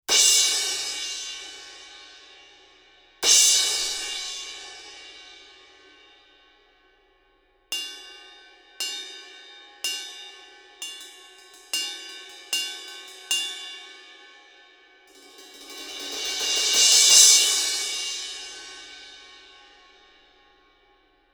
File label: Cymb Crash; 60 Degrees From Vertical